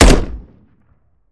weap fire; weapons; gmod; viper; scharlie

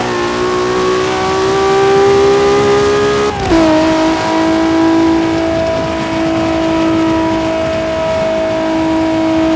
fourth cruise, vehicles

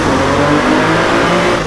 second, vehicles; bmw 340i

